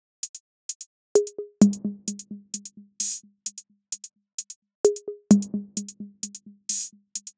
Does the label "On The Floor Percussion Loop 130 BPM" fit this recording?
Yes